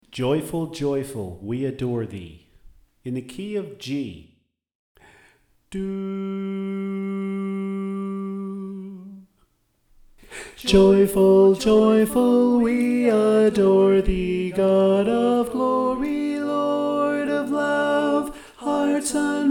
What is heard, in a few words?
Tenor